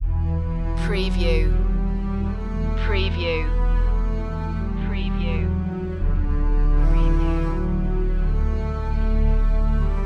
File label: forest fantasy no vocal